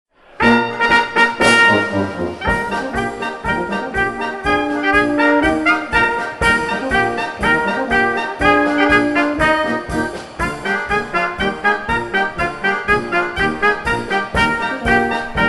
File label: Beer March live